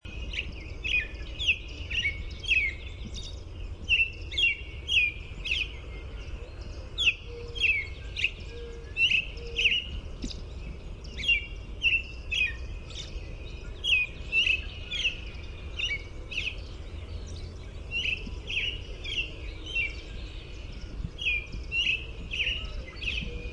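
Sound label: American Robin song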